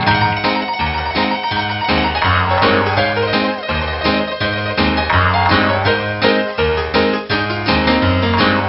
piano

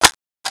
BAR Clip out, weapons; dod

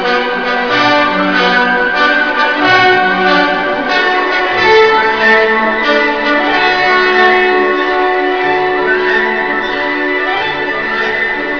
loop; tfc

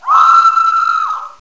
scream; svencoop; paranoia

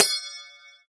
grenade hit, weapons; svencoop

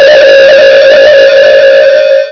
fuzz

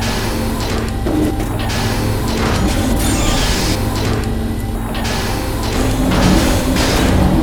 machine loop; svencoop

